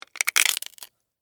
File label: Termite Bite, Animal, Termite, Bite, Hard, Crunch, Wood, Insect, Bug; Ants